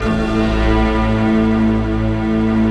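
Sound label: Chinese orchestra; Optical Media International; Sonic Images Library; Chino Combi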